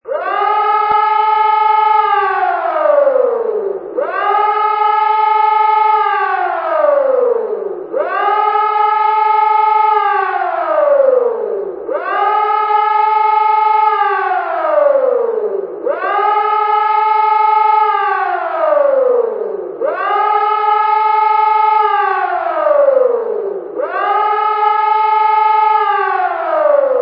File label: alarm